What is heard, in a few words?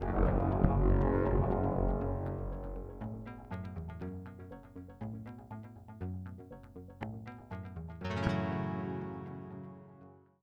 wave synth